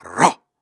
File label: Grunts and Chants, Viking Vocal